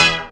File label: DR. LOOP; X-Static Goldmine 3; CHORDS